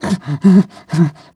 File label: breath long, zombie